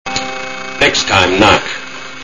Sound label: knock